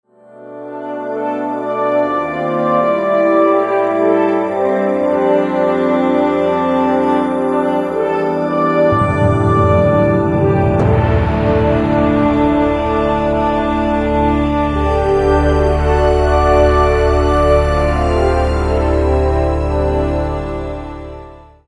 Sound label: soft thunder prelude; Soundtracks Vol.1